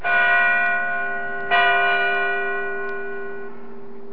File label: bells